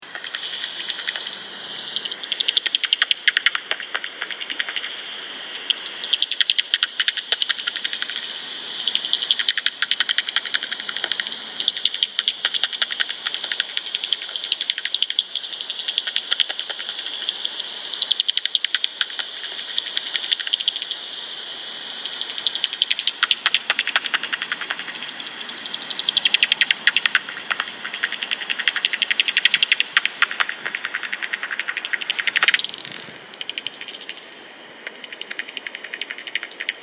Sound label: Bats